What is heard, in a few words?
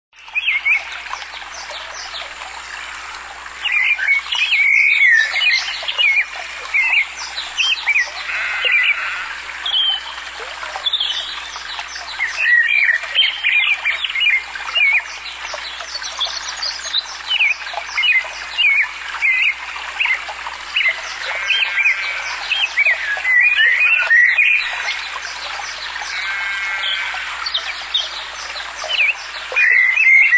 Birdsong